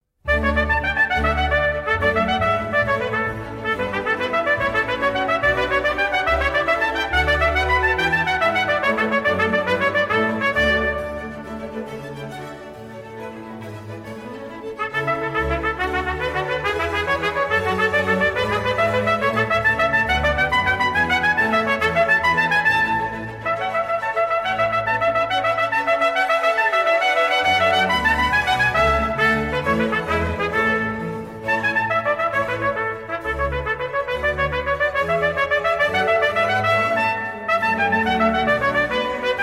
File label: Trumpet Concerto in D Major, Vivace; RV. 10; III. Vivace; Baroque Trumpet Concertos